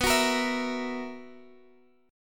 B Major 7th Suspended 4th, Guitar Half Step Down chord